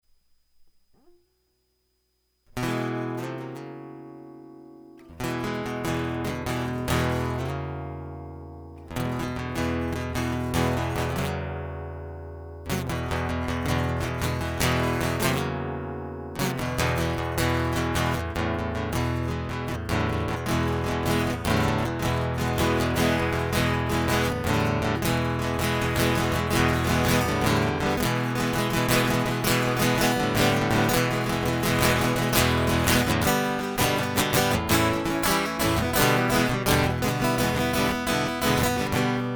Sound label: Guitar Loop